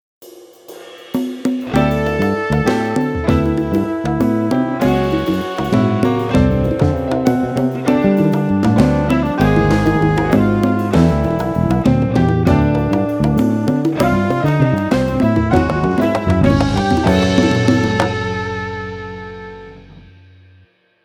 congas

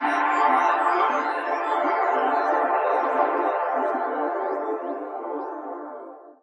space chord

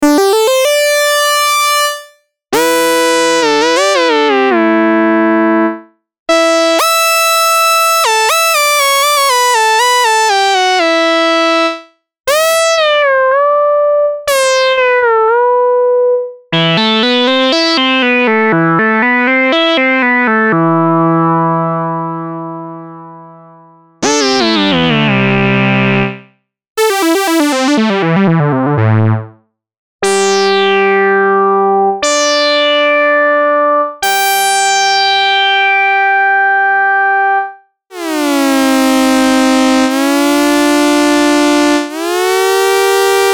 lowdown leads presets; jterris

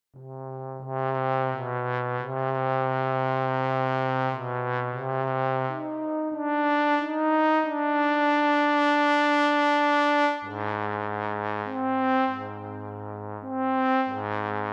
sea monsters brass trombone

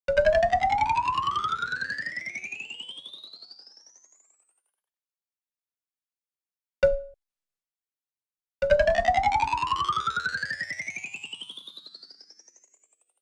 H910 harmonizer; Eventide

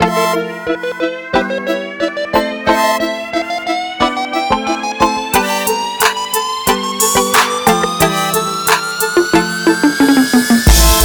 finger paint serum presets

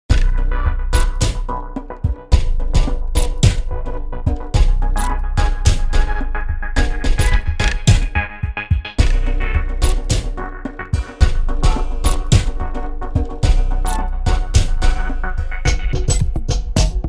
agressive crush

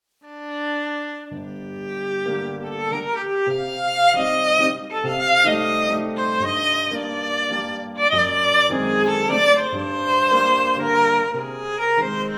valse viola

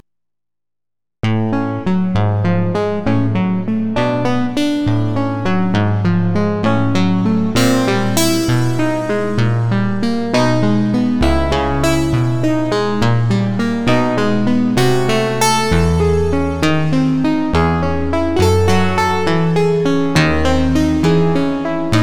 resonant lyre

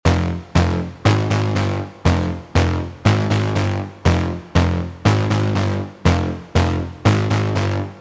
brass big win; serum preset

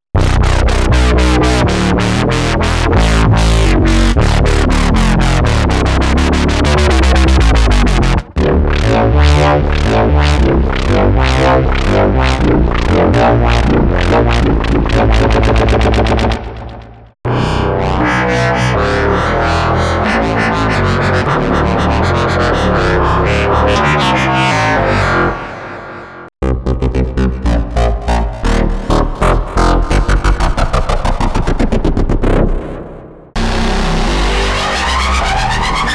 basses dubstep

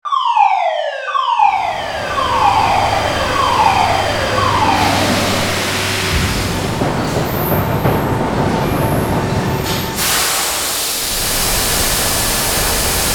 factory ambiences

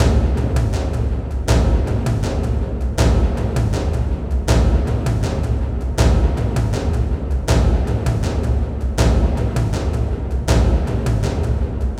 tom loop 160 bpm

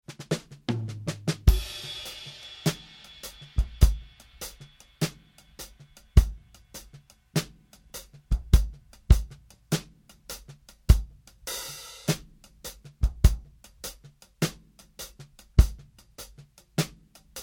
102 70s blues full

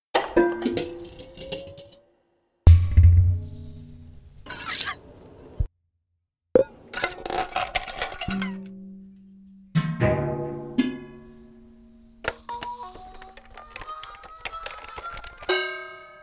clang

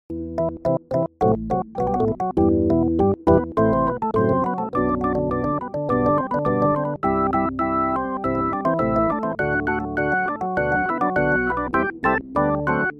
percussive organ

